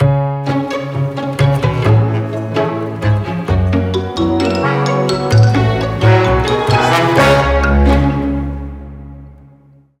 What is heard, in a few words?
instrumental